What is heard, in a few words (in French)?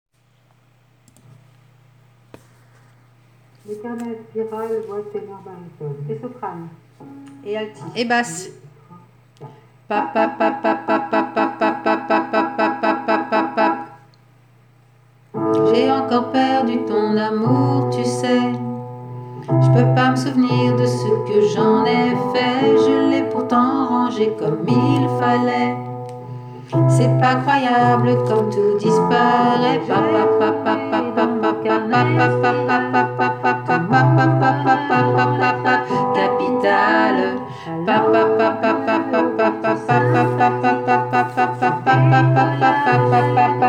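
voix baritone ténor soprane alti et basse; Voix Ténor Barytone soprano et alti première partie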